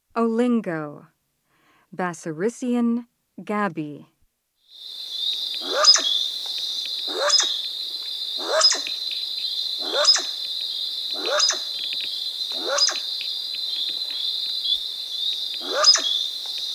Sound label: Olingo; S. America